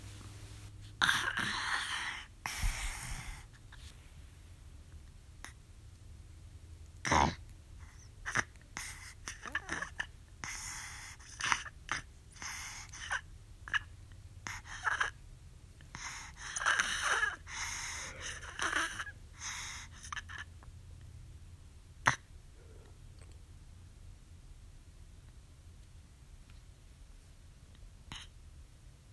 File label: Holds Breath, Toddler